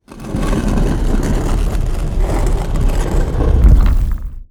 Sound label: stone gate close